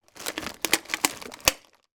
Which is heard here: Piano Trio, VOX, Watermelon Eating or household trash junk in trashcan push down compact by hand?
household trash junk in trashcan push down compact by hand